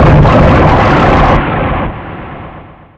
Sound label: hand laser; satan